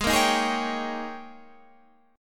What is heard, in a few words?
Ab Major 7th Suspended 2nd Suspended 4th Ab, down, Guitar, Standard; strummed; JGuitar